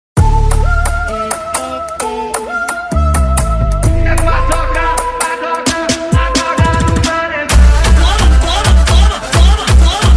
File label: funk remix; cd paredinha st vol 02